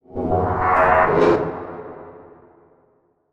Title Transition Digital

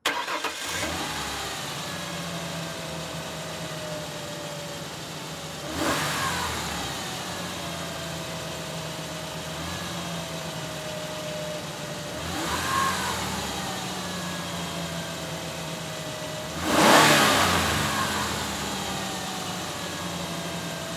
Truck Ford Ranger Start Idle Rev Off Mic In Front; transportation

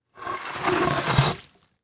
Impact Wood Drag Through Leaf Debris; Impacts

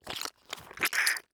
Horror Scary Squish Eyeball Crush

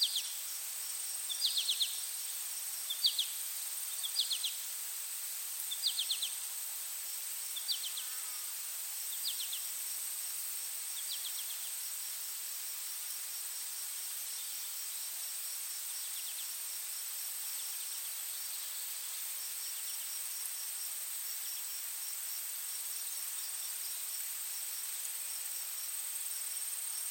Ambience; Relaxation Atmospheres; Epidemic Sound

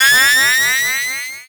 Multimedia Internet CD-Rom Flash Wipe Electronic

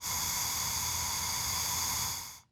Industry Blow Torch Gas Release

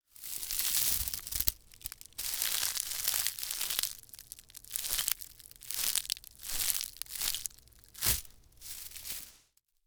Foley Footstep Human Walking Through Brush; Foley Footsteps

